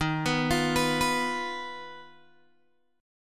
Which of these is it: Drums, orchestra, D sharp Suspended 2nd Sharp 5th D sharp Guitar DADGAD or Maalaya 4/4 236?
D sharp Suspended 2nd Sharp 5th D sharp Guitar DADGAD